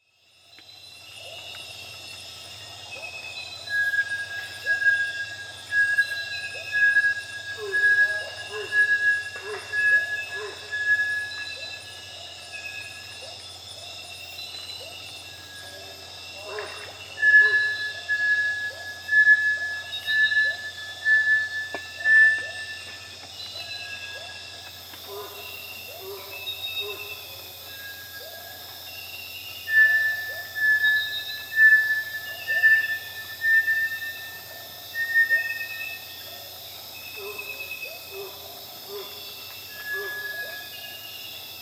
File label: Bartlett's tinamou